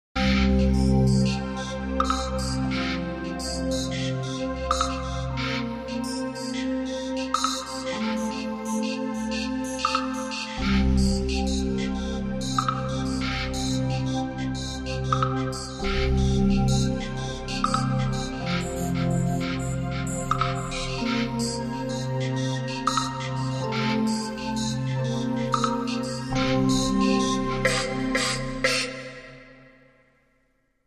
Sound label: Machine Like Neutral